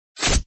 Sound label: attack